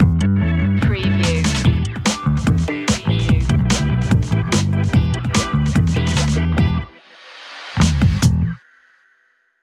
fast and funky stinger

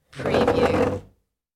move over chair

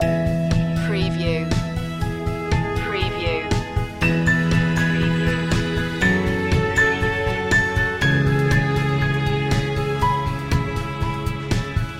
loop

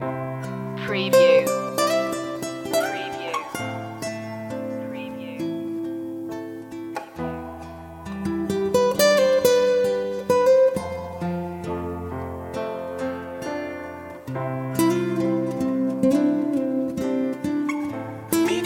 light atmosphere loop

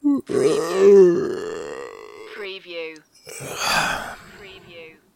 Yawning, Male Yawn

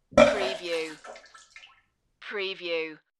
Vomiting

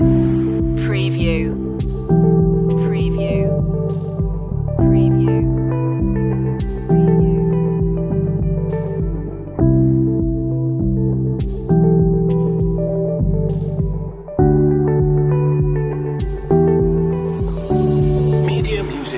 groovy meditation loop

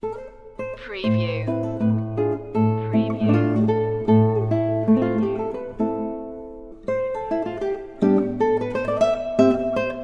gavotte; classical music for media

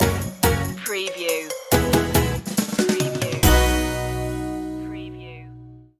keep it simple stinger

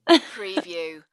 Woman Short Chuckle

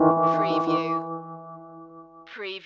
Hip Hop Dark Rhodes Licks 90 BPM Straight - E

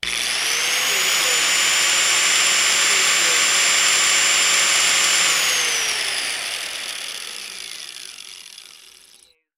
Disc Sander Long

